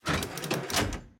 Turret

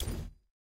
inventory open

